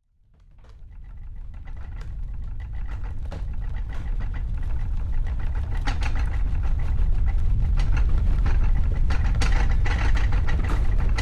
nature earthquake inside house objects shake rattle low rumble